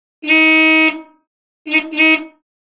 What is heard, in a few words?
Horn Honk Compact Car